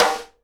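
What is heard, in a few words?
SIDE STICK, Live Snares; E-MU Producer Series Vol. 8 – Platinum Phatt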